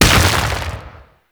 Rock Elemental Punch Impact; Warframe; Warframes; Brawler

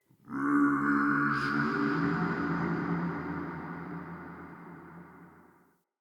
Day Texture, Ambience; Glades Of Andurin

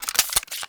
Uzi Pistol Reload; Warframe; Grineer

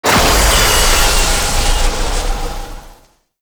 Medusa Puzzle Orb Path; Warframe; Duviri

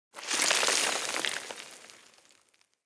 Generic Explosion Rocks Small; Surface Hit Effects

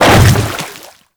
Hammer Boss Step Water, footsteps; Warframe; Grineer Hammer Boss

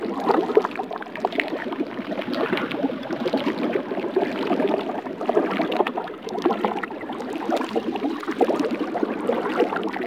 Infested Eris Interior Caves Vein Loop; Warframe

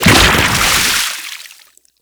Pirate Liquify Impact; Warframe

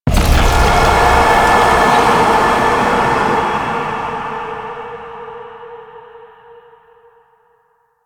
Hex Appear; Warframe; NineteenNinetyNine; Stage11FuturePast

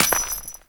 Step Dirt, Footsteps; Warframe; Grineer; Royal Jester; Foley

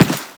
run default, footsteps; Warframe; Teshin

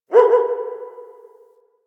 Distant Ambient Dog Bark; Warframe; Ambience; Nineteen Ninety Nine

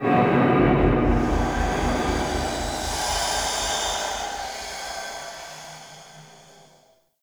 Intrusive Echo; Warframe; Ambience; Entrati

